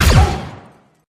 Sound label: resistance blaster